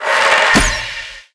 Bone Arrow; Qickskil

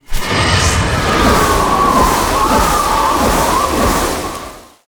Slime

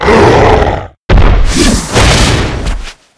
Yeti Skill

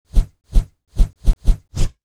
Vampire Bat Combat Stand

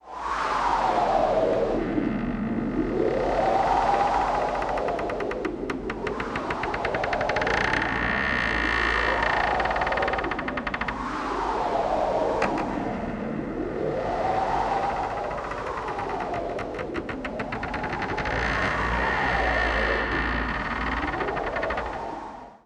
board

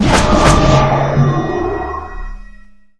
North Breeze; Seer; GamigoNA

